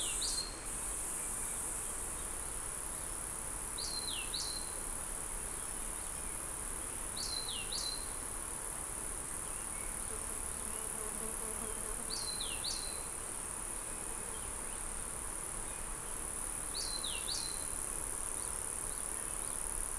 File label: mixture of bird and insect